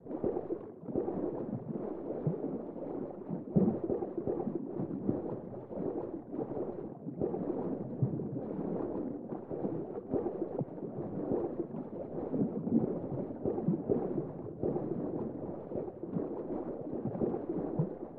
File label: drowners walking